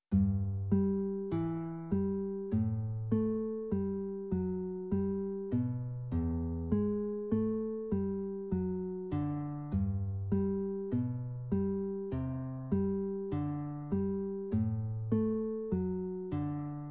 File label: accomp; beginner classical guitar lessons; Exercise 6